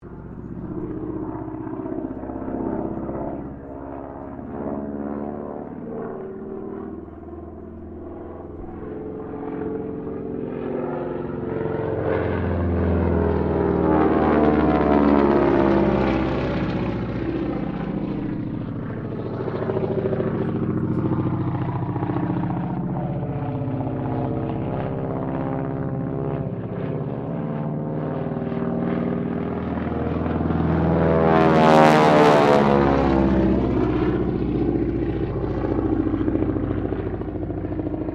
Aircraft AT 6D Harvard Mk III loop, American military training aircraft; Airplanes